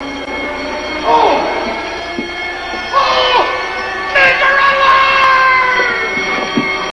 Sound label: alarm; sven